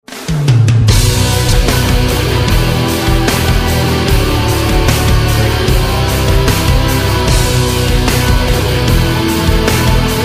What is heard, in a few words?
instrumental